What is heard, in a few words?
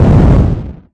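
explosion, VIDEO GAMES; ripoff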